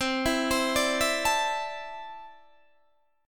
C 6th Add 9th C down Guitar DADGAD

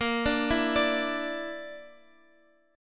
A sharp Major Flat 5th, Guitar, Open F; arpeggiated; JGuitar

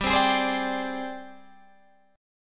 G sharp Major 7th Flat 5th

